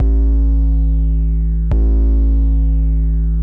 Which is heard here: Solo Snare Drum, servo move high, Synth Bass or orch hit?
Synth Bass